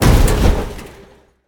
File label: boar attack; smite; god artemis